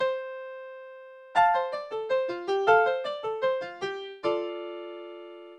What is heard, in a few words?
Piano Septuplets